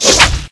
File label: mandragora attack